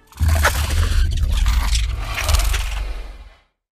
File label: Bloodstalker sound